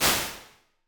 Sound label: DRUM L480, Impulse Responses; Reverbs; PastToFutureReverbs; CLA; IRs